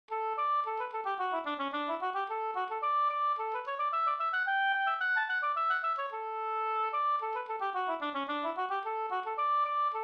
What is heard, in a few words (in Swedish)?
polska Bingsjopolska; Hobergsgubben